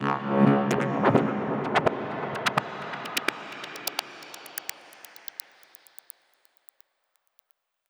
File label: FX Misc